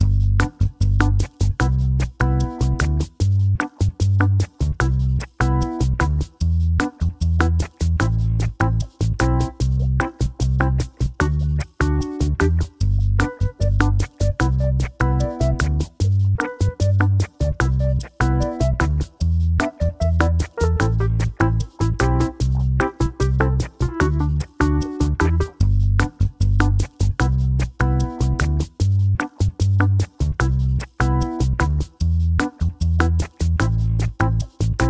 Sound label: Background Loop; Jackbox Party Pack 1; Drawful